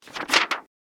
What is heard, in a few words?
turn the page